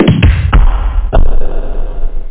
drum

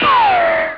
shot; BESTSHOT; noisybit

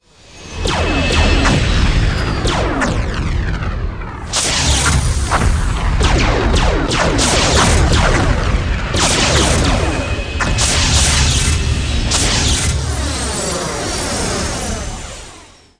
Space Battle; Battles

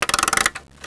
winding; WAV Sound Effects; houshold; clokphon

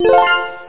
collect special; backbone

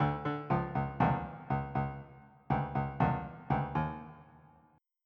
Reggae

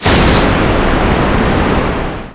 FALL; pcrazy; Bovine

